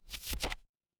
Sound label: paper fabric page turn